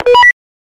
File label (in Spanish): Radio Comunicador